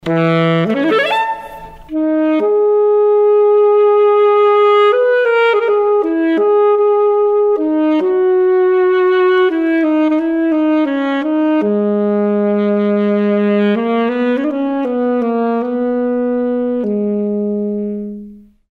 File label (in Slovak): alt sax